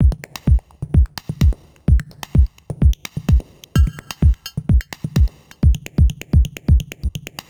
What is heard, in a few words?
drum; whisper